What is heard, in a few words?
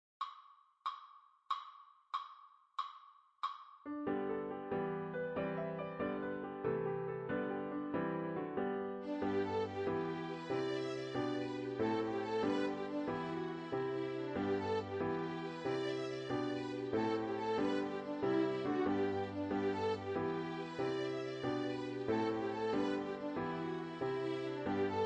92bpm